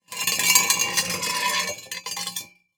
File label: Impact Exhaust Pipe Drag On Cement; Impacts